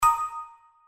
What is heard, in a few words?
Bonus Reel Spin Stop, slots; Majestic India